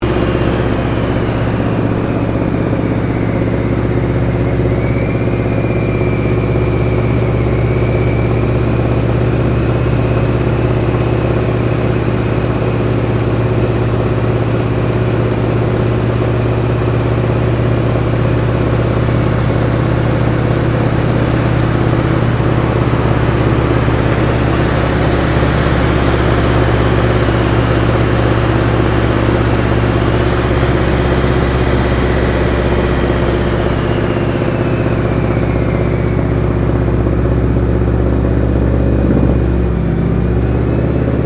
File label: AIRCRAFT AT-6D HARVARD MK III FLYING INTERIOR; Harvard Training Aricraft; Airplanes; Vehicles